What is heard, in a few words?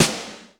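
GATE snare; Crypton; DrumBase5000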